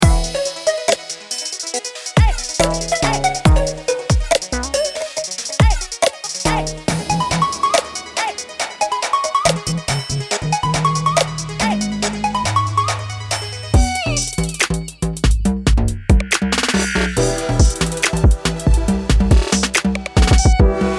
140BPM Kit Medley; maschine expansions; radiant horizon

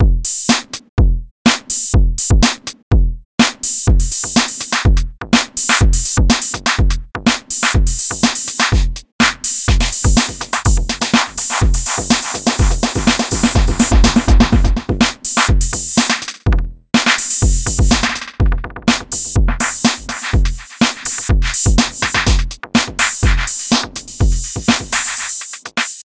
Beat Delay; MASCHINE STUDIO

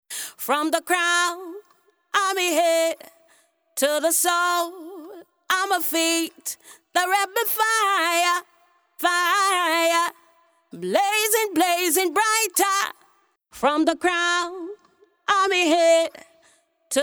PHASER

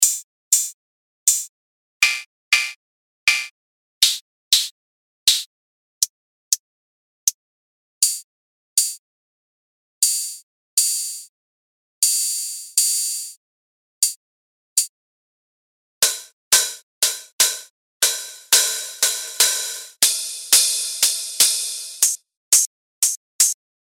Solo Hi-Hat Module; MASCHINE 2.0

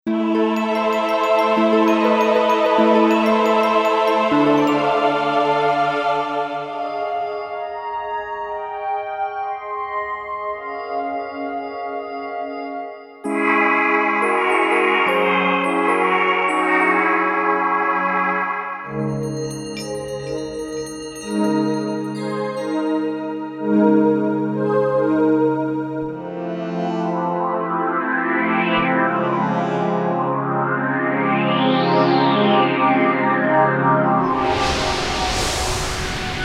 PAD Synth; Calliope

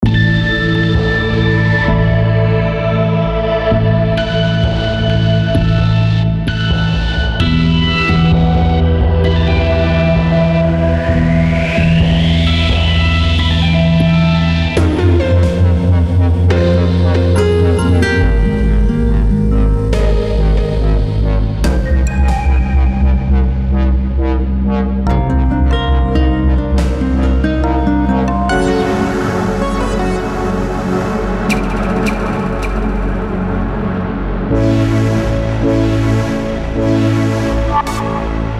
Medley Melodic Kits

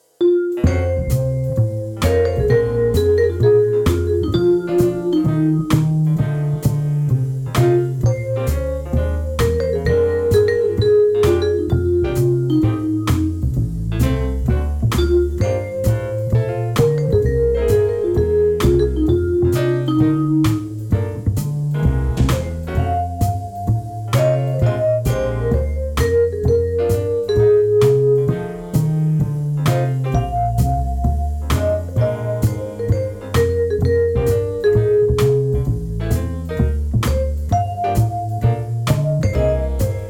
Vibraphone; Soniccouture

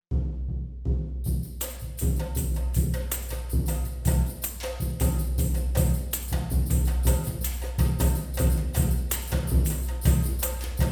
Percussao Do Brasil; Sonokinetic

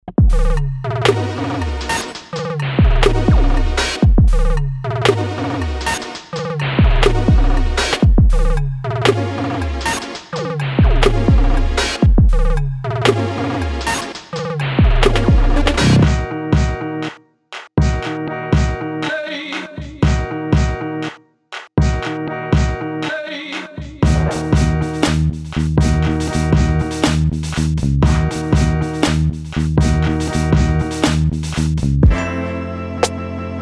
120BPM Kit Medley

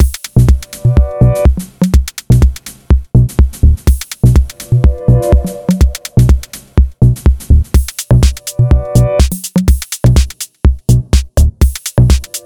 Electronic Kits; maschine expansions; vintage heat

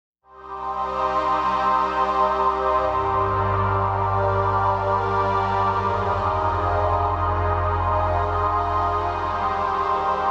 Spacey Strings; Siel Orchestra

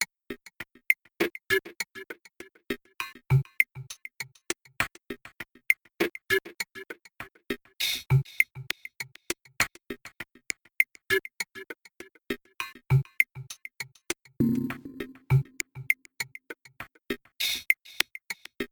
Tick Arps

